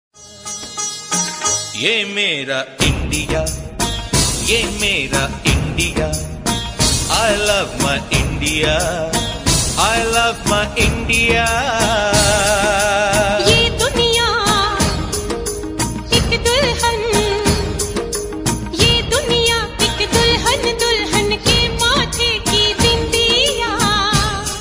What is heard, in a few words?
Hindi Desh Bhakti Ringtone